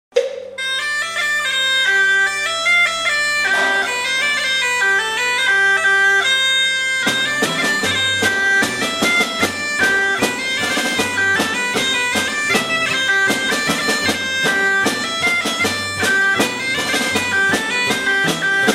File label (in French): MUSIQUE